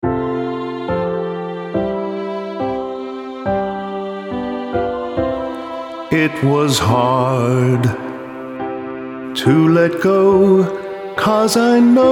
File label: Male Vocal